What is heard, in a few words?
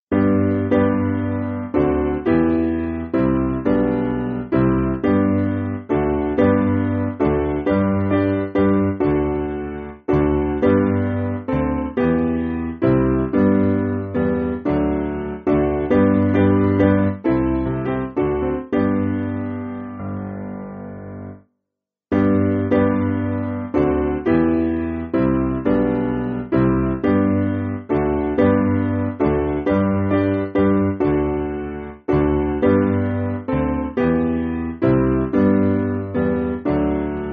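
Piano, Backing Files for Churches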